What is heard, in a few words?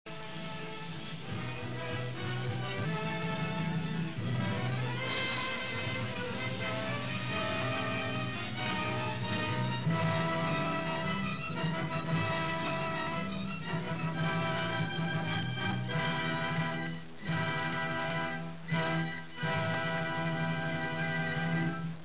adagio dance